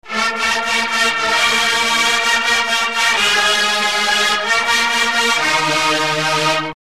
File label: fanfare short